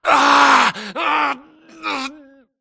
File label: grunt Struggle; Titanfall 2